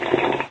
drink sip hot; There; ThereClient

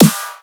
Snare, drums; Ableton Live 12 Lite Core Library

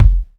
Kick Layered

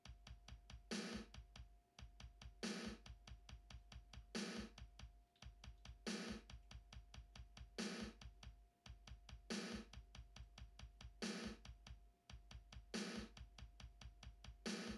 Recorded Drums Recording

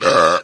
burp hit; There; ThereClient

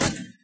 bump; There; ThereClient

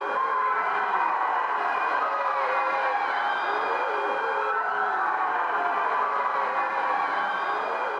crowd screaming; background

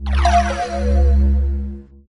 drink cocoa; There; ThereClient

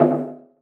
Conga Pitched; One Shots; Drums; Ableton Live 12 Lite; Core Library

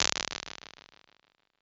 Early Reflections Comb Space, Impulse Responses; Ableton Live 12 Lite; Hybrid

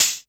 Hihat Closed; One Shots; Drums; Cream

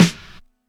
Snare 3k Hiss

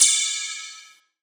Ride Spread; Drums

